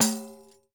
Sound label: Tamborine - A#; percussion; Cymatics - Infinity Beta Pack 2.0; Zeus Percussion Collection